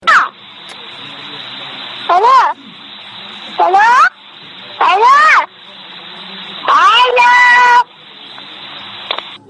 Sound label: child melody hello